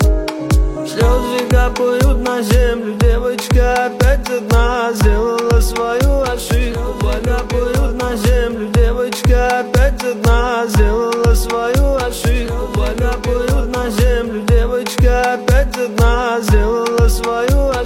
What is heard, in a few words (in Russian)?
Танго